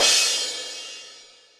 Akai XR-20, Drum Machines, Cymbals

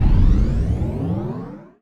sfx sci fi; Masterbits - Switch FX

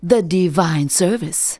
vox, Techno Trance; Ueberschall

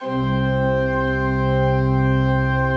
C1, Cathedral Organ; Propeller Island

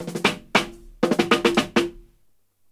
125 fill dry, drum loops, dub; 125 BPM; Drumdrops In Dub VOL-1

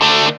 GTR Crunch, Dirty; Club-50 - Foundations Roland

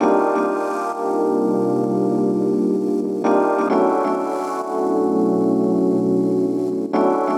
Epian Loop 130; Equipped Music; Loungin House

